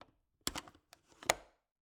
Cabin, window, latch, flip, lock, open; Eiravaein Works; Vaeyan IV

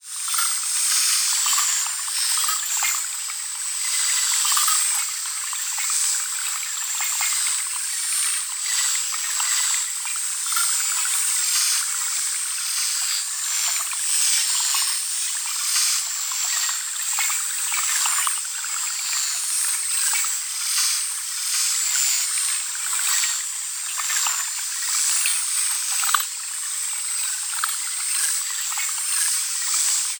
PARTICLES SMALL SLOW Ting-A-Ling; boom library; Neon

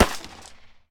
gunshot; goon; Blind Drive

